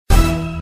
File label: wild stop; Criss Cross 81; wazdan